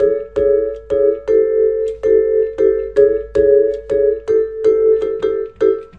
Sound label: 080 simple sevenths epiano; KEYS ORGAN PIANO LOOPS